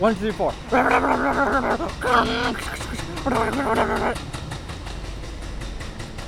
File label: Construction Sounds; In San Francisco